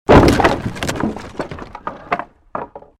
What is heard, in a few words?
created wooden barrier smash medium